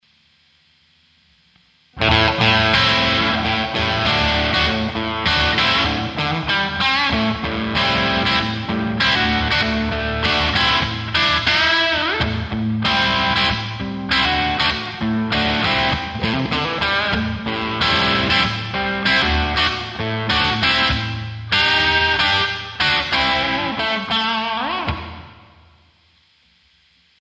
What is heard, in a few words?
Bridge Fender OD Blues, humbucker